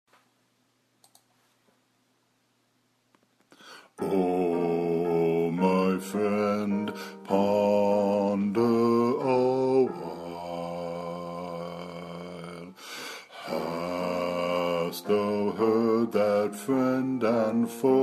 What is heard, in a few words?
bass